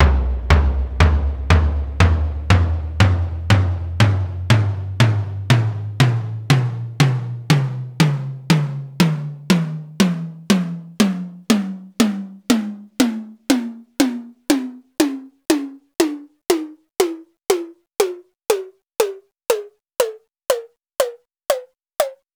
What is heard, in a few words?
melodic tom tonleiter